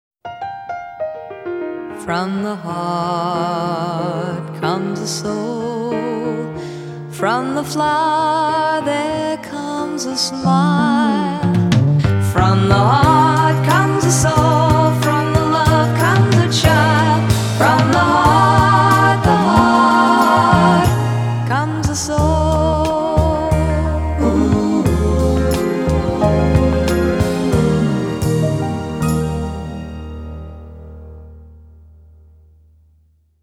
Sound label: Crown Chocolate Commercial